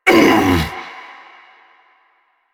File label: Stalker Pain Grunt; Warframe; Jade Shadows; Quests